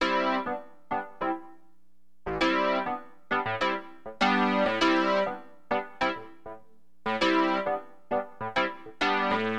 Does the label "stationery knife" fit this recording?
No